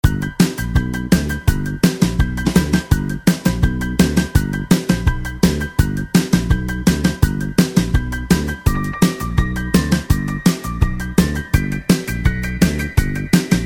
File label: (G) No Backing Vocals